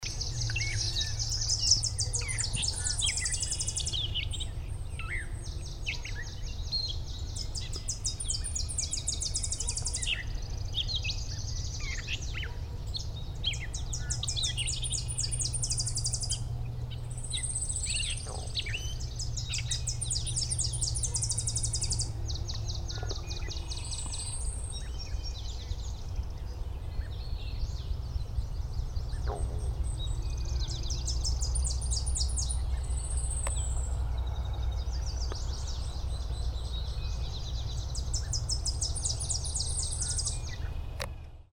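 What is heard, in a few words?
Tennessee Warbler, Finzel, Maryland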